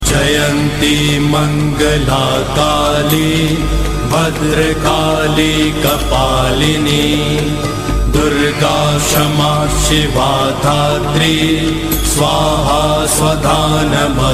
durga mahamantra; Navratri Special

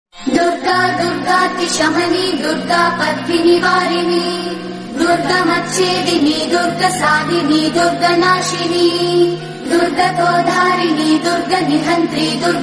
MAA DURGA MANTRA; Devotional; Ringtones

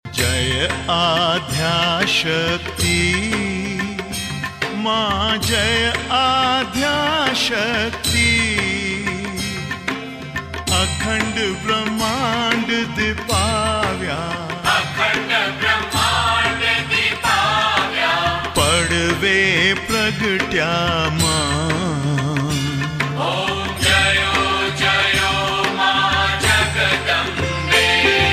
aarti ambika durga; Navratri Special

